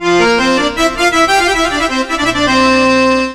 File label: SYNTH, ARABIC; USB Soundscan vol.43 - ArabianTraditions; AKAI